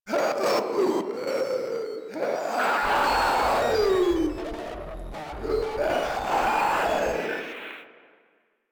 Proto Infested idle; Warframe; InfestedNinetyNine

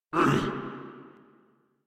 Pain, Grineer Male

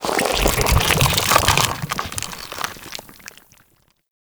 Infested Bone Blade Movement Foley; Warframe

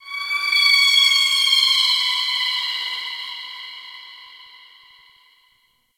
Ocean Underwater Creatures Low Medium; Warframe; Grineer Ocean

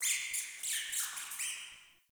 Cave Mine Rat; Ambience; Midrath Island

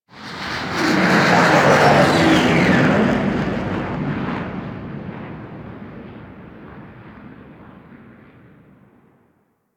Ship Fly By D; Sentient; Warframe; The New War; Plains Of Eidolon; Mission One

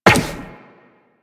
Run Zipline, Footsteps; DE; Warframe; Tenno

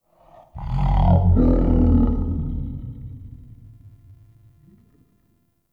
Root Cave Ancient Tree Groan; Ambience; One Offs; Neathuns Root Cave

